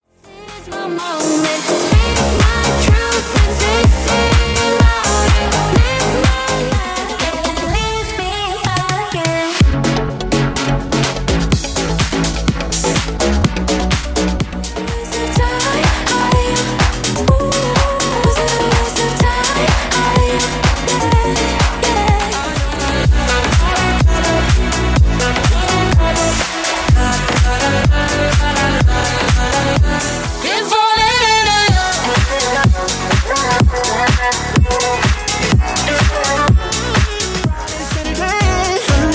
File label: Dance Club House Mixes 126Bpm; megamixes